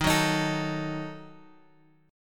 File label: D sharp Diminished D sharp; D#dim Chord {X 6 4 X 4 5} strummed